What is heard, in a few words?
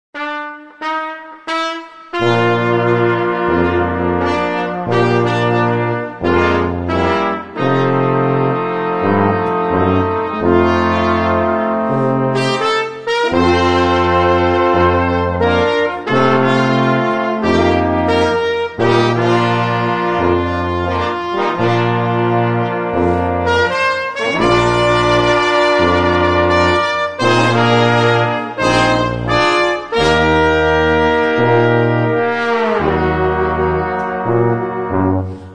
Tradicional American Just a closer Walk